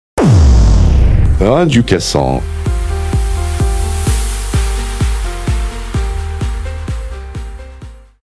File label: jingle